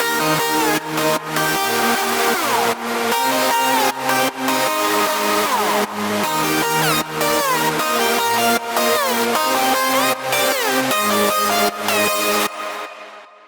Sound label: Solo Shredder + Aggressor; Altitude